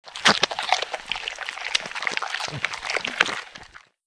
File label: butchery; csgo; wcs go